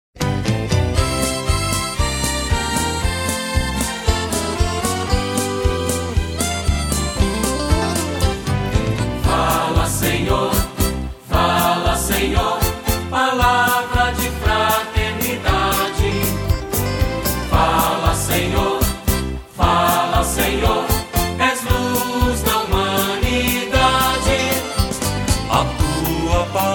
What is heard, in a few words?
vocal